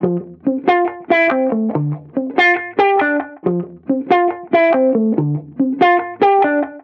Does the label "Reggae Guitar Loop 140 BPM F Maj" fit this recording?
Yes